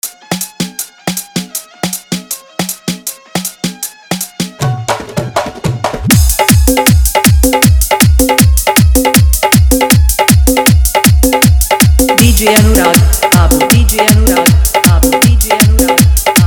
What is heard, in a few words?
Bhojpuri Hot Dhollki Bass Mix